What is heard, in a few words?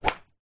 sport golf swing club hit ball